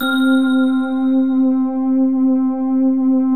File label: DIGI BLEND, Emu Classic Synths; E-MU Formula 4000 Series Vol. 3; Analog Odyssey